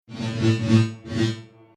Whoosh Sci Fi Saber Waving Back And Forth, Whoosh Scifi Saber Slash Back And Forth; Light Sabers